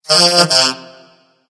hehheh; smite; ganesha

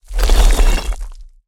spawn dirt; Smite; Cabrakan

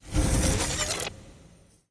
intro gears; smite; chronos

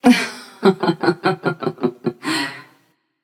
laugh passion; chaac

